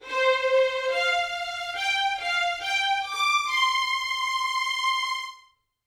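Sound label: violin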